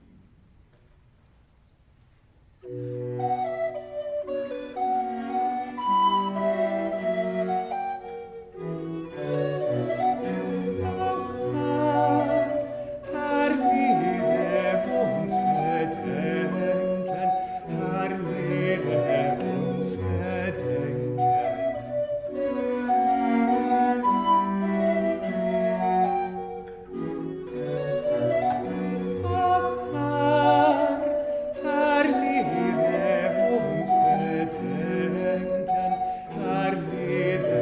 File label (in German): Bach Gottes zeit tenor solo